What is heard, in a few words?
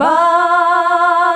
"BAH" F, FEMALES; Best Service; Hyper Dance